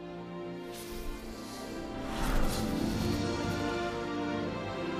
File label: half volume